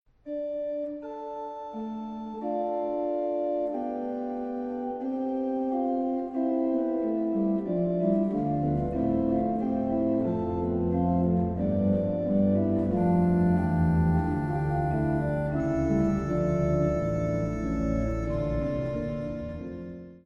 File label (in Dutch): Orgelvers Pedal